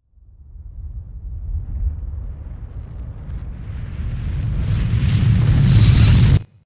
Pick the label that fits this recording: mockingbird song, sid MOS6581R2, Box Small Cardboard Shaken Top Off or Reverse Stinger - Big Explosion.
Reverse Stinger - Big Explosion